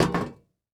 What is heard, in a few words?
Foley Footstep Golf Cleats Single Step On Metal Platform; Foley Footsteps